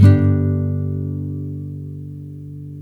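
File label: NYLON, G MAJOR DOWN, CHORDS; Spectrasonics; Hans Zimmer Guitars VOL-1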